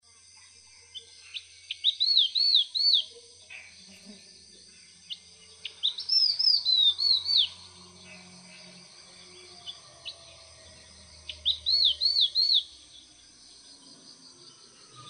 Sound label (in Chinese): 粉紅鸚嘴; 棕頭鴉雀; 鶯科/留鳥